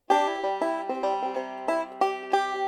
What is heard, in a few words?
pick